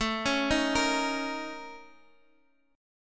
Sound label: Bb Minor Major 7th Double Flat 5th